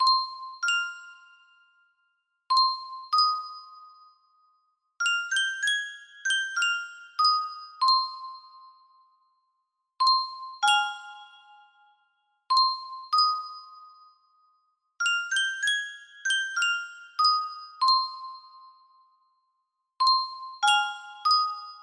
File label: music box, music box melody; speed grapher